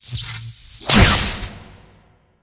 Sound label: machine attack, monster